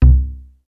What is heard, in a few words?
WARM BASS; East West - Global Sounds; PROC.BASS